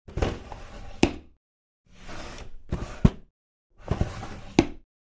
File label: Cabinet Door Close Ca, Cabinet Door; Close; Cabinet Door Slides Close,